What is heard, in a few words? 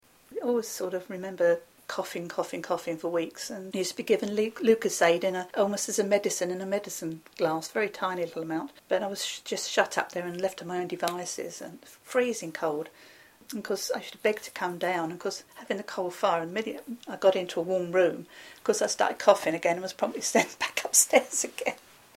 coughing